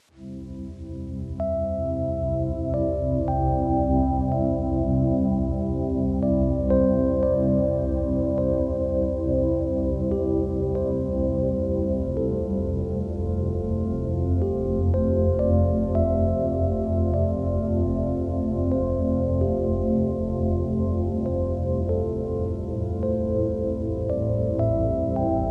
happiness frequency serotonin dopamine and endorphin release music binaural beats